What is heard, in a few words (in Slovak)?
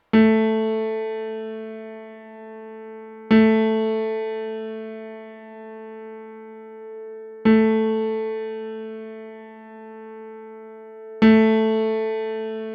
ladenie, sax